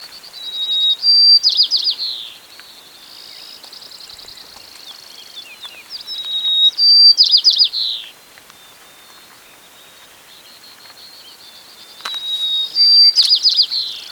White-crowned Sparrow Zonotrichia leucophrys pugetensis